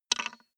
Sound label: wood board paperclip drop; objekte; holz